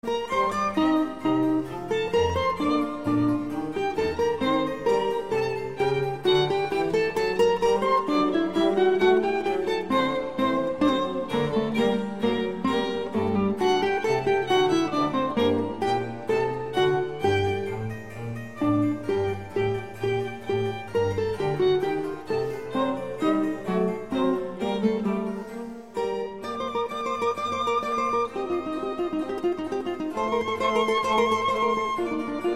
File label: Trio Sonata in G Minor, I. Andante Molto